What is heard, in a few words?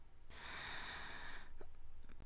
Breath; Lewd SFX